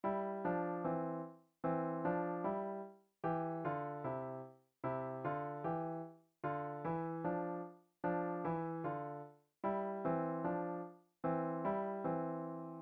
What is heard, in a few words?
Three Note Phrase, piano